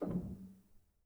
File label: rel, Grand; bitKlavier; Binaural